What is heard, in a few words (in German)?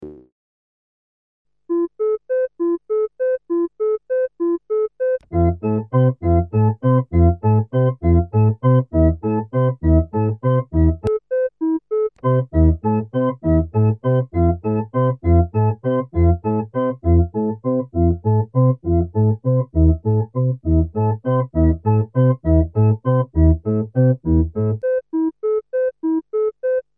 Behringer Model D mit EHX POG2 Pitch Shifter